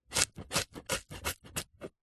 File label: food courgette zucchini chop dice sequence knife wooden chopping board